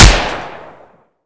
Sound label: micro uzi; brainbread